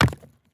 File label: masonry hit